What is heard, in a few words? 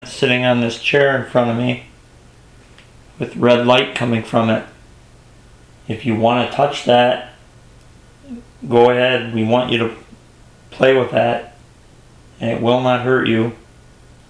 Woman Weeping, EVP; Tomah, Wisconsin